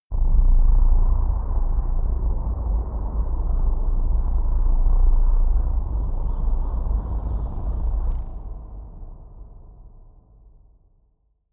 dark rumble cricket, drones; musikelemente

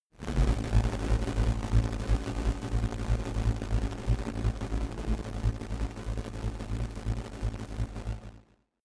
dark rumble noise, sciene fiction

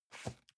cardboard box medium place slide soft; objekte

